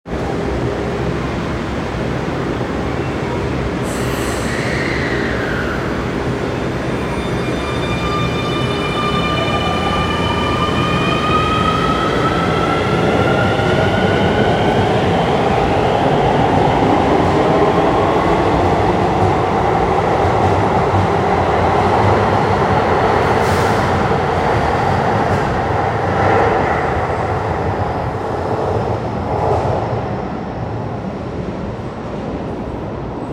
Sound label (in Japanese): 線調布東接近 and ambience, train sounds; 京王